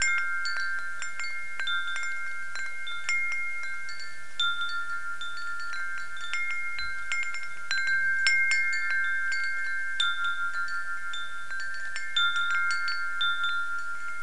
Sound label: Windchimes